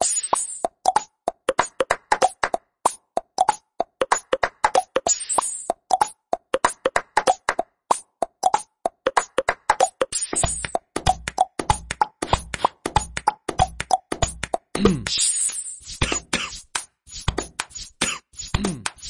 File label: Foley Human Body Sound Effects Rhythms; Soundtrack Loops; Foley V3